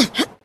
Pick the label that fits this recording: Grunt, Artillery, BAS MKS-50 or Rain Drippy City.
Grunt